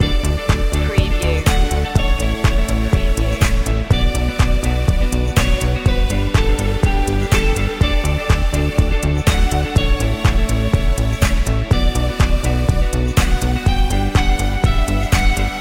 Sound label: background corp loop